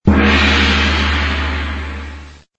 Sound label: asian gong